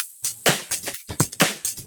Electro Loop 128BPM; Vengeance Samples

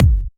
Kicks; Vengeance Samples

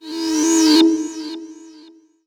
Tonal FX D#

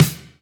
Snares; Vengeance Samples; VEH2

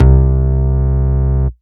Ultimate Bass Root C; Vengeance Samples

